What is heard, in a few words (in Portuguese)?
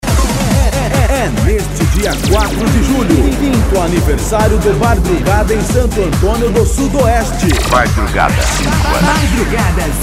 sertanejo remix e electro dance